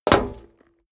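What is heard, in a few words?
step dress boots metal dry jump, footsteps; schritte; menschen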